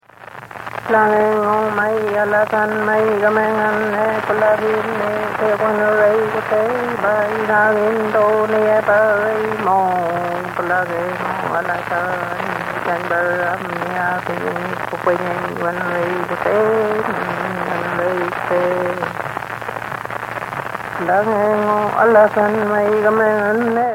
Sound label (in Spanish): Ranchera, Zapala Neuquén Argentina